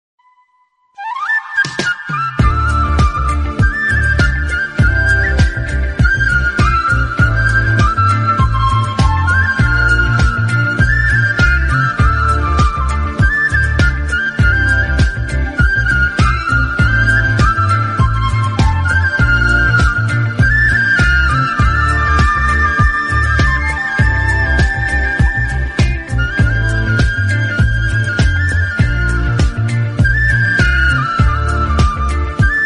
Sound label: Instrumental Flute Ringtone